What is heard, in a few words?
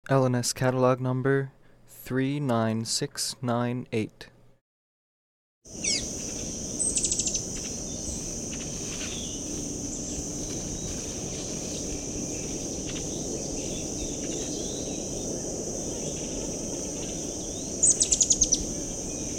sapphire throated hummingbird